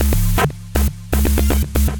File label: Fuzzer Bass, Synth; Ableton; Factory Packs; The Forge by Hecq